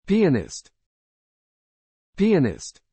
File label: pianist